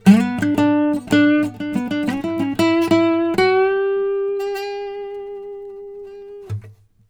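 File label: baritone lick E, Blues Guitar; ILIO; Fretworks; 120 BARI LICK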